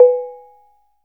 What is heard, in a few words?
CANANG B4; Spectrasonics; Heart of Asia